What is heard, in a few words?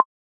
Tap